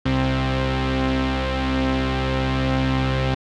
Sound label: Synth sound